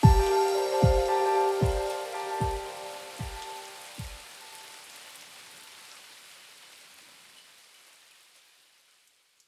Piano Pads; BB 2HTC Samples Vol 4

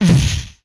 Explosion, retro arcade; MMRetroArcadeSoundsPack

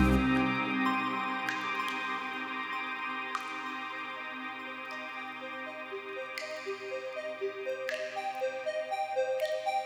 Suspense, Pads; BB 2HTC Samples Vol 4

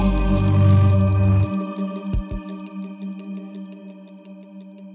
Tension Pad; Pads; BB 2HTC Samples Vol 4 Addendum

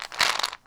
Glucosamine - Roll, pill bottles

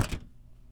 Bang on Shelf; BB Retail Therapy Sample Pack

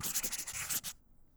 Thicc Tip Scribbles, Writing; bb - Books, Paper, Writing

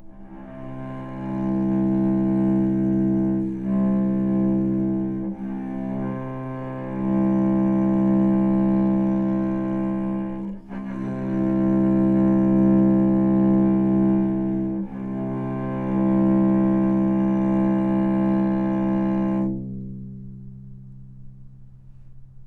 C2 - Sustain, novice cello

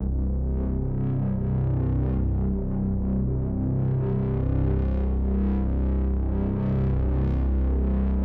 Pads; Gigasaw

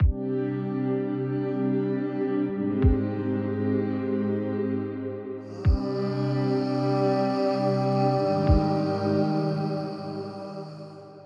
Chill Pad; BB 2HTC Samples Vol 4; Pads

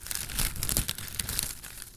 Crinkle Plastic; BB Retail Therapy Sample Pack

